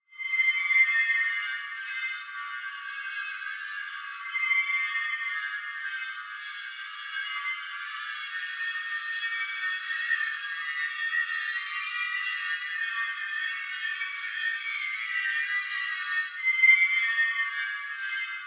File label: Badinerie with Spectral Bin Shift; SOUNDMAGIC SPECTRAL; Michael Norris